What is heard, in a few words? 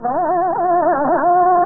Kambhodhi Shruti C